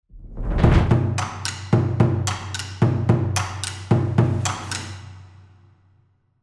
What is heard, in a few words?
Percussions